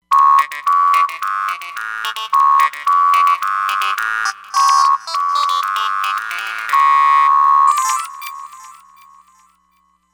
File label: Metalic Bass, Roland Fantom XR